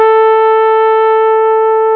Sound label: LED, S-LEAD; AKAI S-Series CD-ROM Sound Library VOL-8